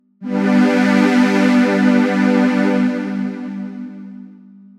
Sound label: Jupiter Str; jup8